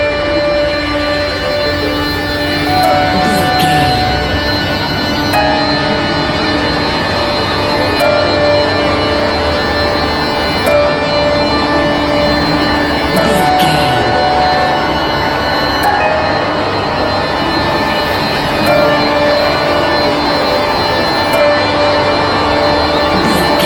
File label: modern horror; Video Game